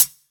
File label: Hihat, Psytrance; One Shots